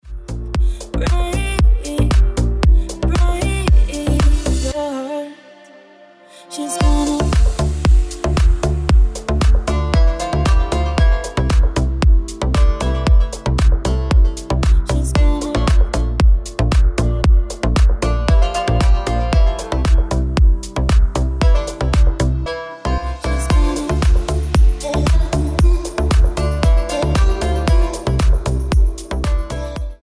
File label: Ringtone